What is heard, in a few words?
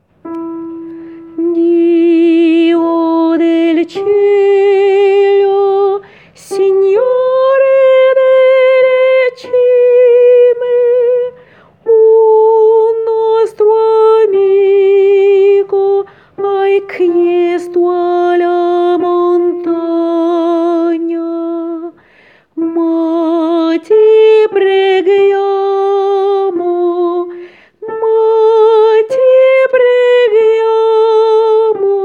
Signore delle cime Soprano